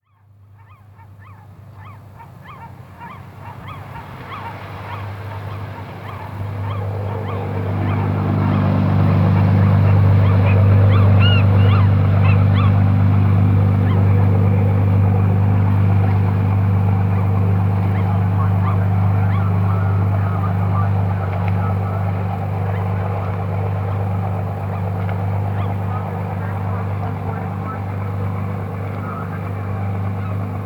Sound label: Early Morning On Yaquina Bay